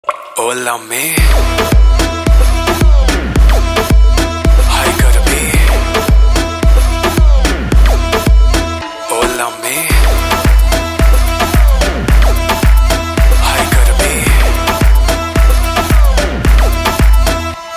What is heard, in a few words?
BGM Ringtone